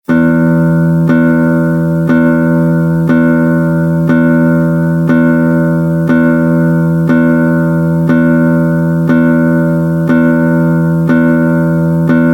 Gitarre Saite E

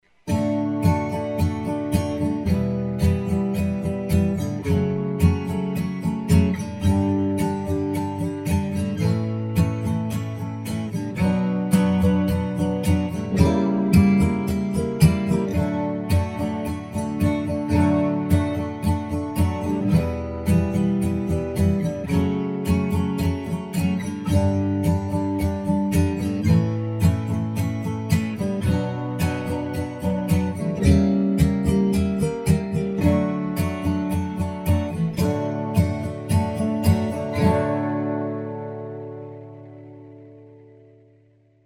D Dur Akkorde

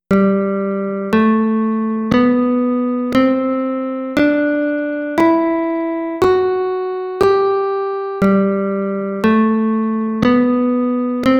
G Dur Tonleitern fuer Gitarre

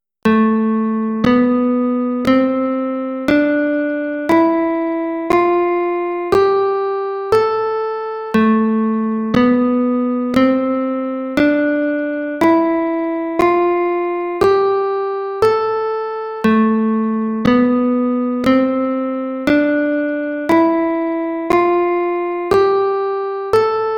A Tonleitern natuerliches Moll aeolisch

